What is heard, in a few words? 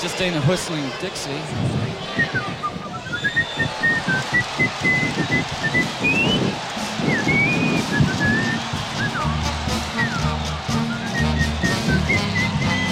whistling dixie